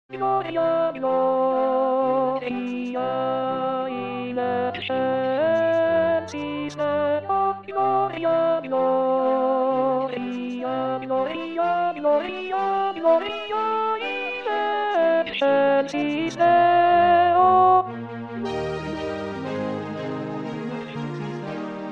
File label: Alto; Puccini; Messa; Gloria